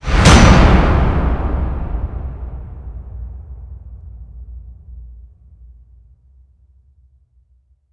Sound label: Impact Hit With Swish; Empire Binge